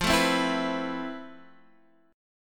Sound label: F Major Sharp 11th, Guitar, Half Step Down